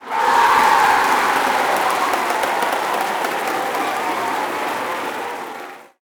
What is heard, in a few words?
Crowd Cheer; Pitch Black Audio Pong